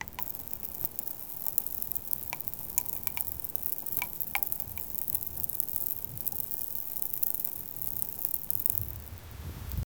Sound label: fine sand pouring and cas